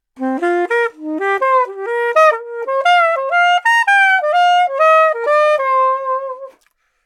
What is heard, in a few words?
Cool 4ths Sop C7 Swing 120bpm